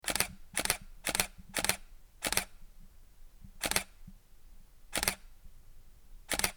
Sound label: Canon EOS Rebel T3 1100D Burst